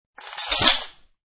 Tape Measure In, Tape measure rolled in; Hand Tools (Sound Effects:Tools)

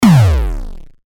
Hit Bass Digital Drop; Blastwave

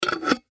Pickle Jar Close, Pickle Jar Close Lid; Food; Household; foley; Blastwave

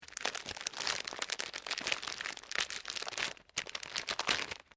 PLASTIC WRAPPER OPEN; Various kitchen appliances; Materials

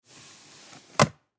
Ambulance Cabinet Door Slide Open; Emergency; Cabinet Doors (Sound Effects

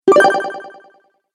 Retro Videogame Big Single Bonus

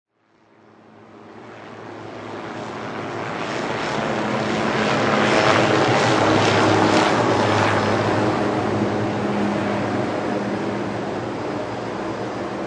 Helicopter Fly Overhead Fast; Helicopters; Vehicles

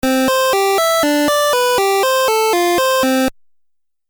Musical Phrase Video Game; Musical Sound Effects

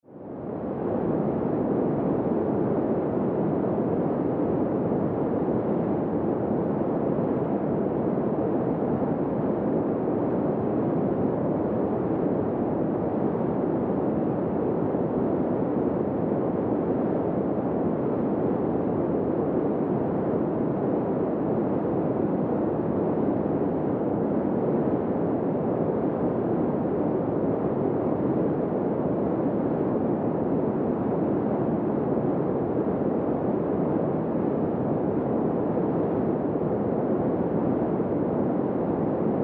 Wind Drone Winter Cold Steady Constant; Weather; Wind Sound Effects; Blastwave

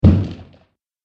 Body Impact - Blood And Guts; Injury; Injury Sound Effects

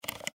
Mouse Scroll Wheel Up; Pc Mouse Scroll Wheel Up Computer; Technology; Computers; Electronics; Blastwave

